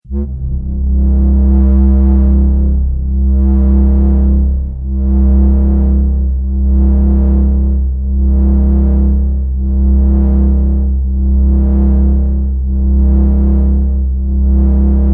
Drone: Eerie, Menacing, And Atmospheric Monotone; Drones; Sound Design; Drone Sound Effects; Drones (Sound Effects:Sound Design); Stuart Duffield

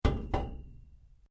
METAL CORRUGATED IRON PIPE LARGE STRIKE

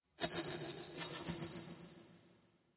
Magic Illusion; Magic Sound Effects; Magic - Illusion 09; Role Playing Game

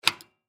Door Glass Lock; Locks; Office; Blastwave

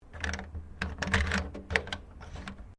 KEY LOCK SECURE; Objects

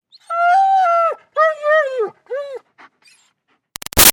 Dog German Shepherd Whine Calling Out; Dogs; Animals; Big Room Sound

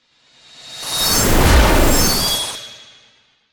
Whoosh Complex; Whoosh, Chopper, Twirling, Object, Production Element, Imaging Element, Accent, Transition

